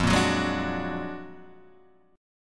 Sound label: Gb Augmented Major 9th, Guitar